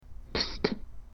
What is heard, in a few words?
psst